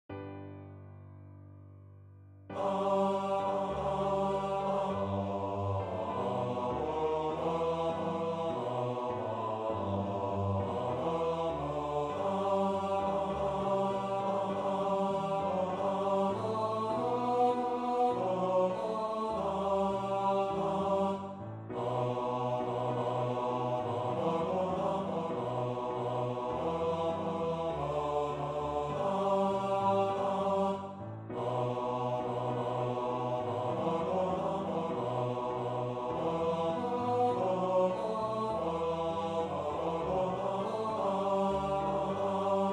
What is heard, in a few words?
Ukraine National Anthem Baritone